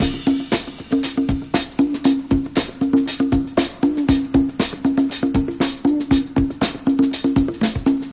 118 bpm fresh breakbeat sample c sharp key, kick tuned to the C# note